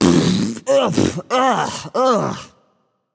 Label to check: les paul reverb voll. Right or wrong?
Wrong